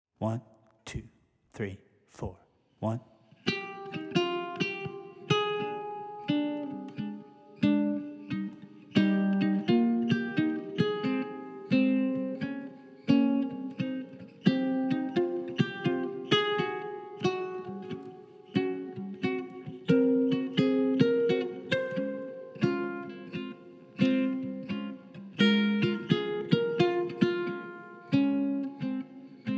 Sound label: in a mellow tone, uke